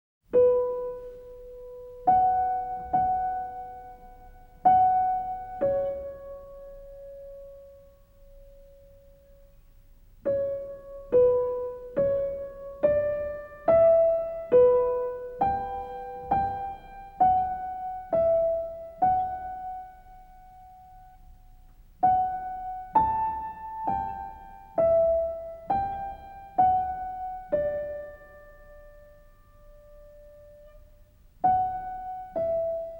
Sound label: Piano solo